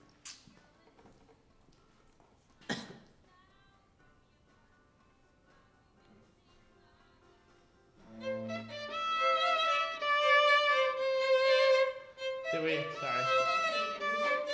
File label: violin